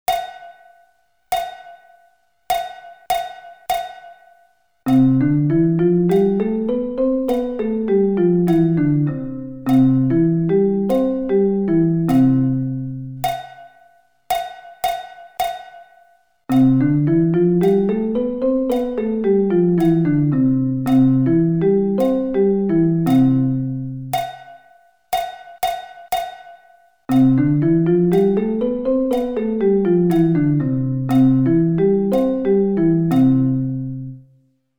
C Scale

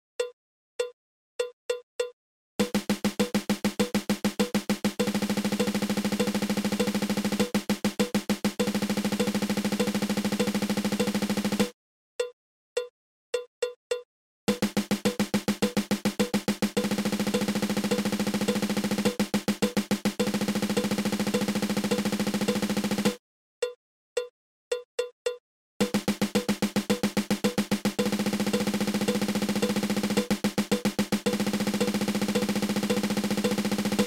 Double Stroke Roll

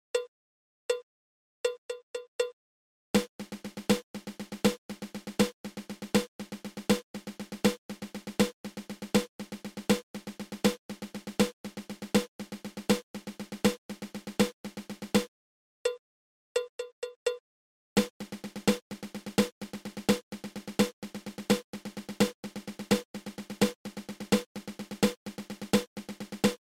Five Stroke Roll Triple

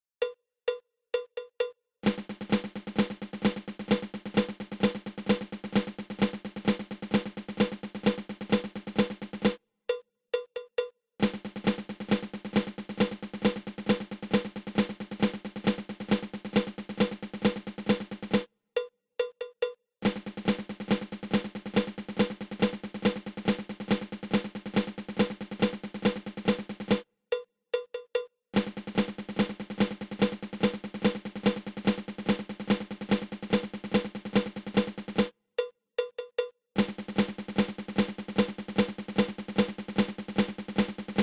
Flam Paradiddle

